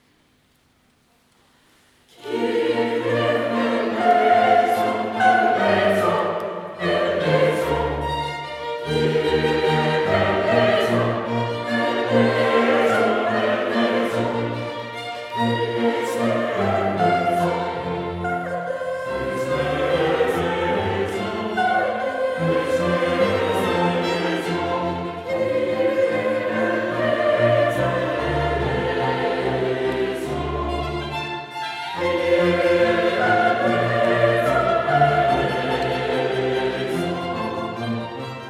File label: Kyrie, choir